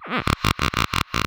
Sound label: laser